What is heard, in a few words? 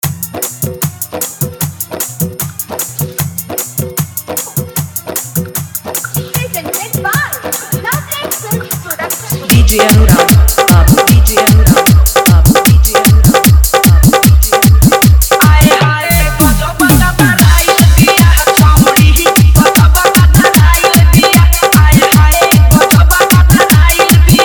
Bhojpuri DjSong Hard Dhollki Bass Mix